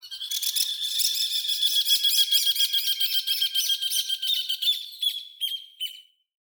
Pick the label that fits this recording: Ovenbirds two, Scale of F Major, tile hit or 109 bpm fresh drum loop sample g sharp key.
Ovenbirds two